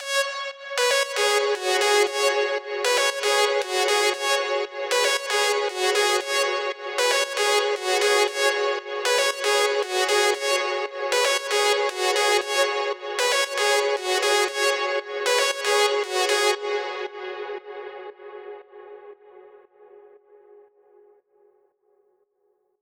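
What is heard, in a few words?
Synth, 116, F minor, Tail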